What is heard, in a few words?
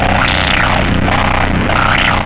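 RUMBLE left, Hard & Loud Techno; USB Soundscan vol.01; AKAI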